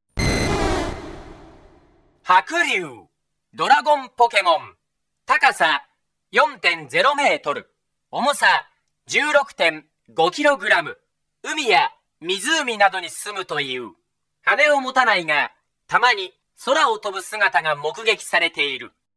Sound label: dragonair, Pokemon Gameboy Sound; Pokemon Gameboy Sound Collection